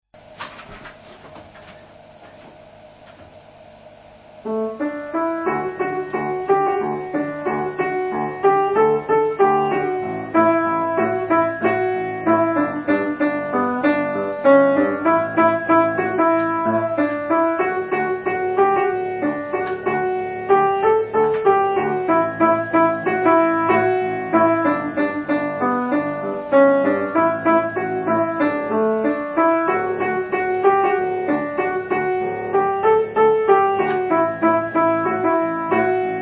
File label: piano; fortepiano